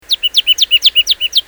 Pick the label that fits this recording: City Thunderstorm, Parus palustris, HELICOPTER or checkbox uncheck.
Parus palustris